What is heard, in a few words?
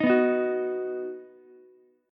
Eb Minor Sharp 5th, Ukulele; Ebm#5 strummed; JGuitar